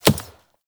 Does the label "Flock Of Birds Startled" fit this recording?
No